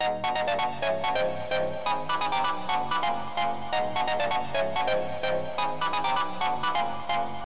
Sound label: organic synth 129 tech Fm; synth loops